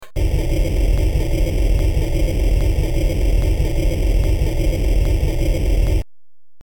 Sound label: door opening; prince of persia amiga rip